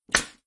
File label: nail gun shot